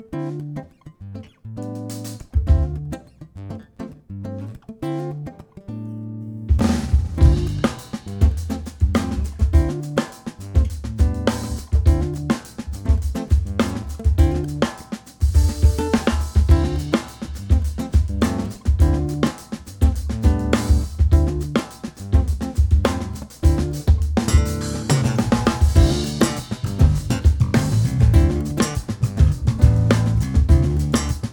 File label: Galaxy Groove Live recording